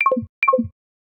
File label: Frog, notifications; Rollable LM R910N; LG